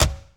Porsche 911T Door Close; Automobiles

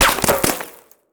Bullet Ricochet; Sound Ideas; Guns

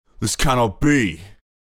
Lose; Pitch Black Audio Pong

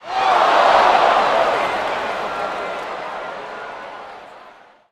BMX Crowd Reaction "Oh"; Crowds

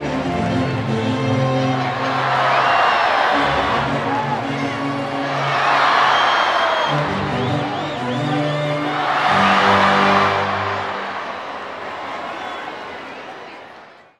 Baseball Organ Crowd Charge; Crowds

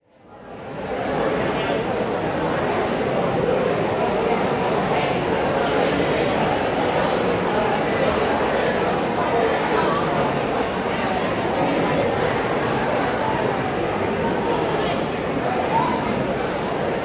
Theater Large Auditorium, Walla; Sound Ideas; Walla Series

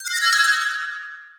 Pickup Magic Bells; SFX Kit; Pickups

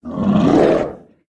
Bestia Werewolf Hit

